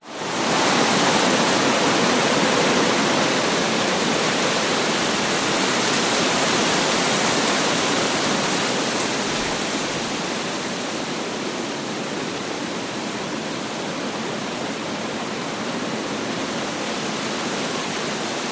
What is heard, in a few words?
wind strong tall trees storm; nature